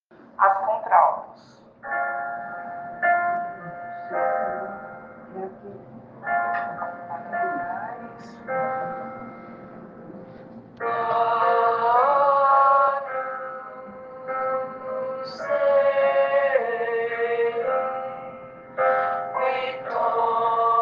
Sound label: agnus contralto; coral sao francisco; missa vigilia pascal